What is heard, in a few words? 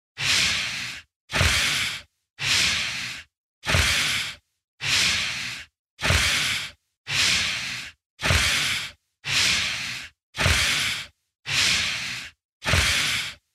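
orc breathes heavily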